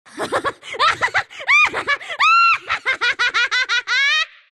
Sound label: sylph wheel laugh; dota; dark willow